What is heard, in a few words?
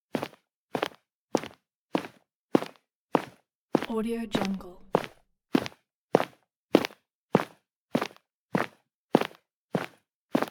sneakers on concrete footsteps pack